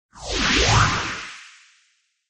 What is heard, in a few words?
Generate Powerup; Secrets of Magic: The Book of Spells